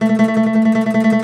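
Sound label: OUD tremolo, Plucked Strings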